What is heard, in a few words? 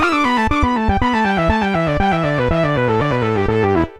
Another Riff, Keyboards